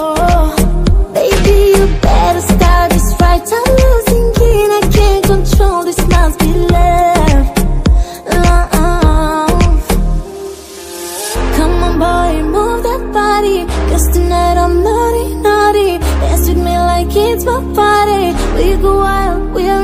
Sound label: new english ringtone instrumental